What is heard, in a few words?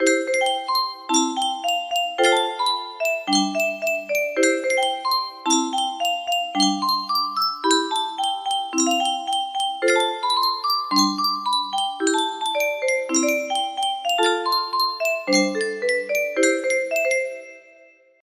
music box melody